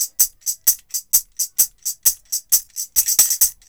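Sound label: TAMB, tambourine; Drumdrops In Dub VOL-1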